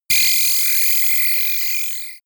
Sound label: energy beam, laser; krieg-waffen; soundpool